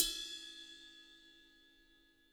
ride bell; Sonic Reality

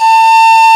Pan Flute A4; Multisamples; Digital Sound Factory Planet Earth

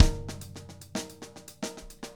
110 LATIN, drum

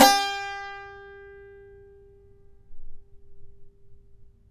Saz G 4; Spectrasonics; Hans Zimmer Guitars VOL-2

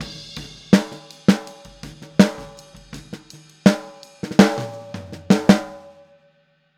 Boogaloo - Outro - Snare, 82 BPM; Sonic Foundry; Drums from the Big Room The Mixes

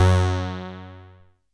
JUNO BASS; Masterbits; Tekkno Trax; BESTJUNOBASS

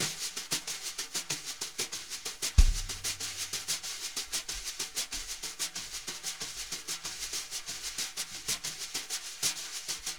BRUSH LOOPS

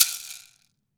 WOOD SHAKER; Cuba; AKAI S6000 CD-ROM - Volume 5

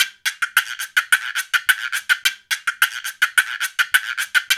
Mozambique, African Contemporary

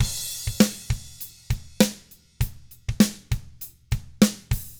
ROCK, Drums; ILIO; Double Platinum Drums 1

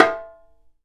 Dumbeck, Drums; PX7; Digital Sound Factory Protean Drums

